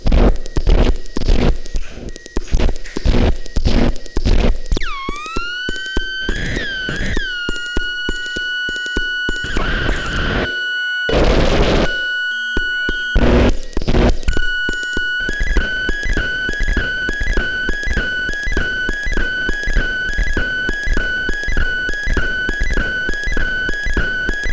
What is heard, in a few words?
feedback